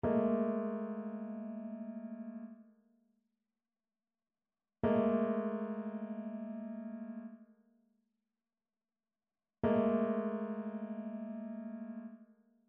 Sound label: trichord; trichord identification